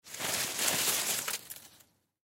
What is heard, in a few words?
Snow Rope Pick Up; Ice (Sound Effects:Weather); Blastwave